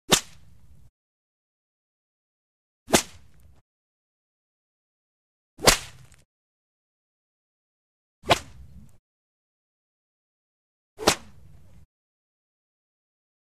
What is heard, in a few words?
Whip Swish Cracks Single Close Up Reverberant Whip; Hollywood Edge Foley Sound Library